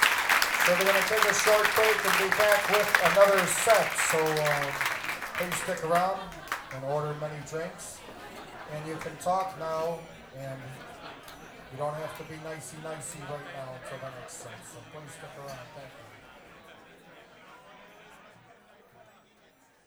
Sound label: Closing cheers